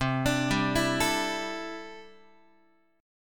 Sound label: C 6th Add 9th, C, down, Guitar; arpeggiated; 4A,4E,5A,5D,5G#,6C#; chord; x,8,5,5,8,8; JGuitar